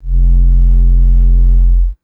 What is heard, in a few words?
deep electric synthetic bass swell; designed